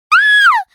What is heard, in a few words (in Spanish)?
grito; lulu league of legends